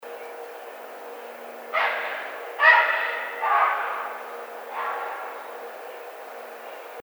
Great Blue Heron

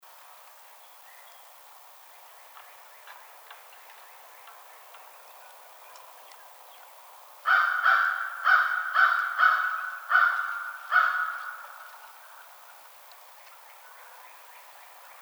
American Crow

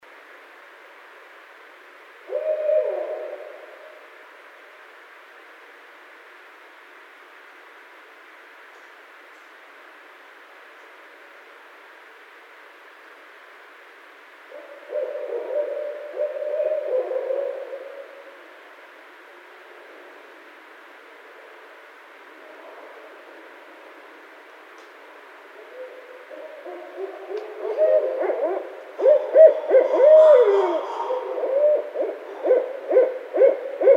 Barred Owl

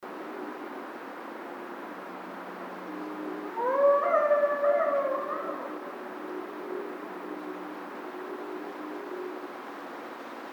Coyotes

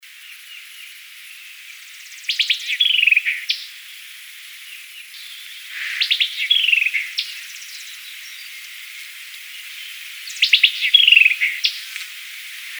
White-eyed Vireo

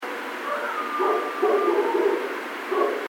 voice, bigfoot

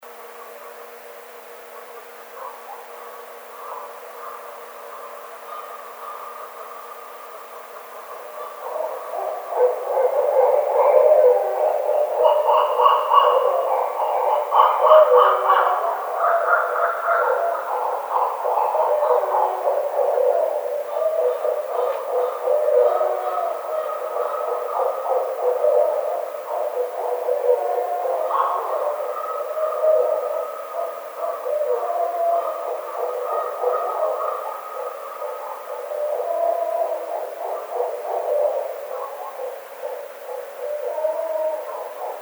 Barred Owl

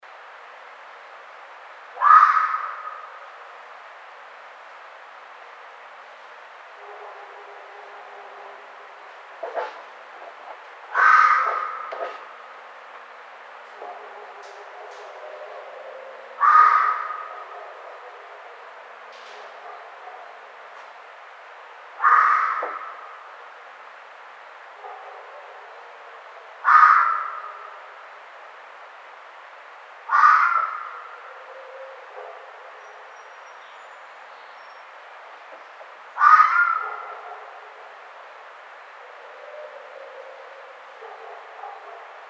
Red Fox

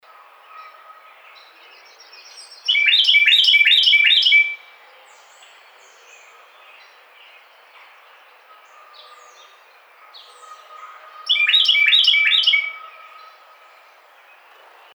Carolina Wren

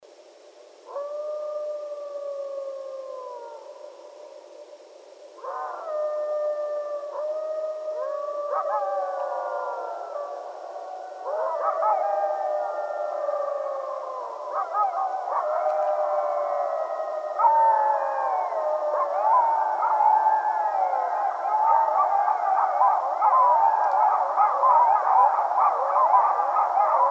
Coyotes; Canis latrans

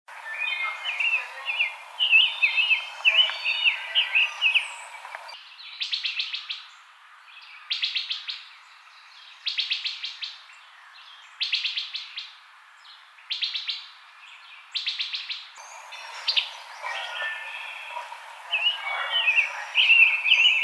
Summer Tanager

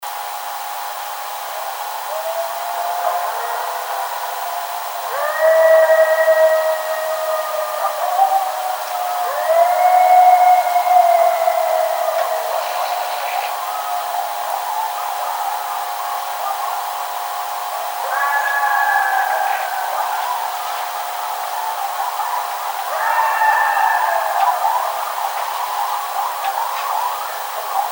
Illinois Howl unfiltered short version

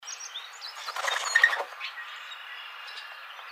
Mourning Dove, birds

